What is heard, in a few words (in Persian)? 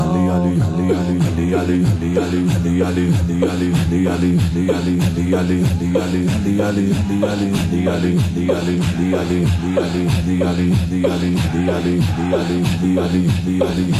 مداحی شور